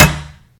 Rubber Glass, Collision Sounds; clockwerk opensim

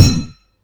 Terrain Metal, Collision Sounds; clockwerk opensim

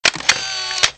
Snapshot; clockwerk opensim

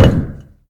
Terrain Stone, Collision Sounds; clockwerk opensim

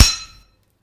Metal Flesh, Collision Sounds; clockwerk opensim

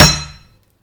Metal Rubber, Collision Sounds; clockwerk opensim

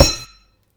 Metal Wood, collision sounds; clockwerk opensim

